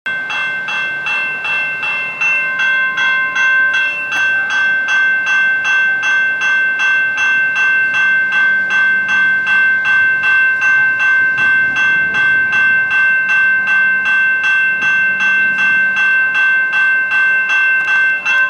Railway Crossing Warning Bell Signal

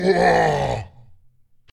Pain Scream Hard

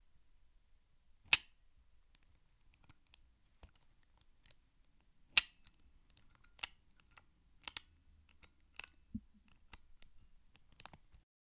Bag Clip

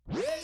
Intro Scratch

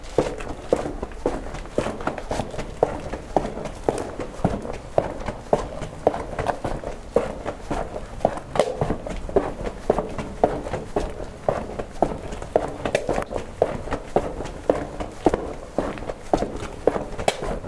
Footsteps In The Cellar

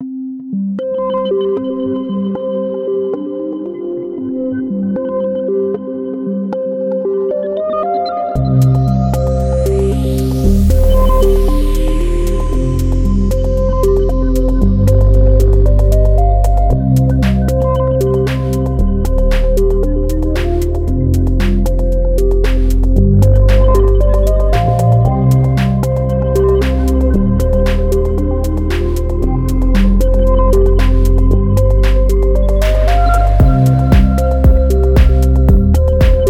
A Track Called Birthday Perfect For Intros

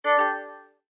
Turning On Alert Notification

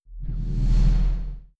Dark Whoosh

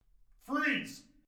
Freeze

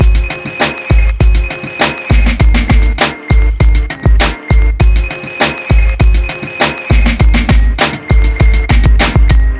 Late Nite Mix Show Bpm 100

